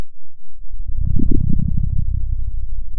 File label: Sound of a dinosaur